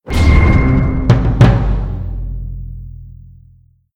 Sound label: Level Up